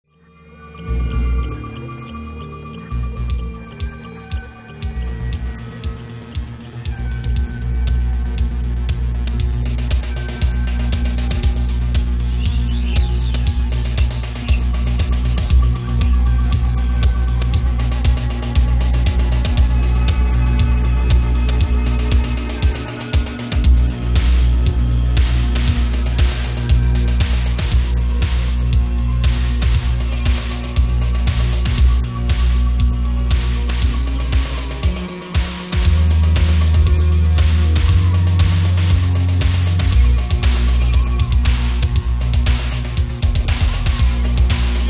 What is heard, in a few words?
whale rave